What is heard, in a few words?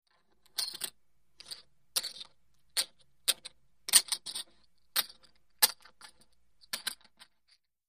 Poker Chip Stacked; casino games chip mvmt